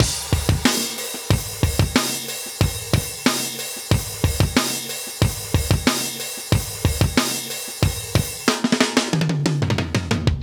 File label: 92 Perfect Scorn, Live Breaks